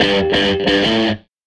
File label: Les Guitar Loop; Sonic Foundry (Sony Creative Software) - Six-String Orchestra